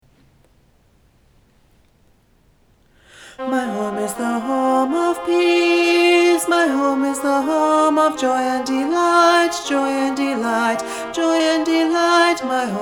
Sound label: alto; home of peace